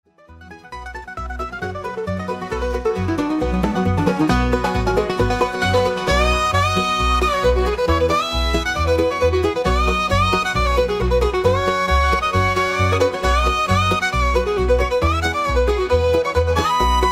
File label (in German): Instrumentaler Countrysound, der die Weite der Prärie vermuten lässt.; Vorwiegend Banjoklänge; Wilde Prärie